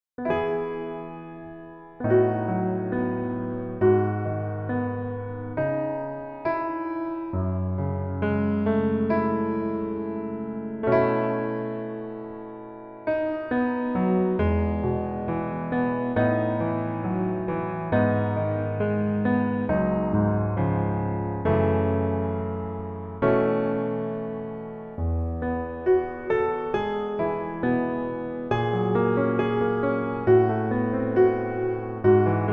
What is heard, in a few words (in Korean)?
Piano ver, 남자키